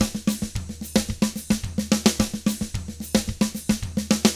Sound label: SMBA, 110 SAMBA, Drums